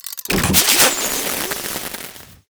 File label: fireworks recall windup; Fireworks Corki